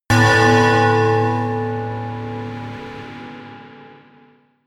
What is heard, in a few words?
melodic church bell tamtam high